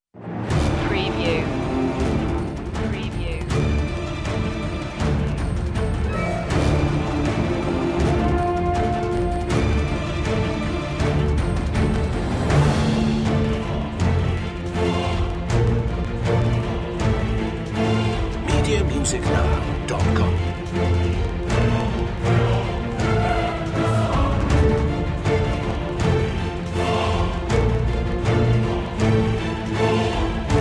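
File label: with choir less percussion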